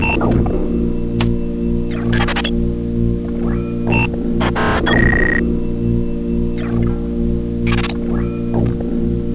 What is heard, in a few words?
computer loop; svencoop; lab